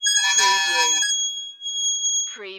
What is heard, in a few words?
Squeak Metal